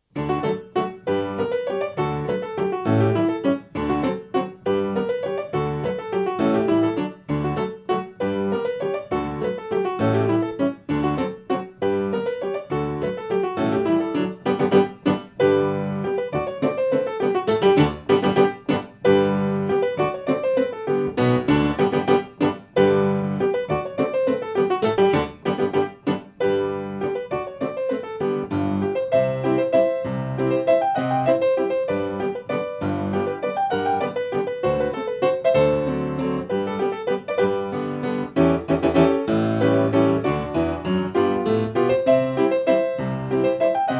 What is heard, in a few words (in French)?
Mazurka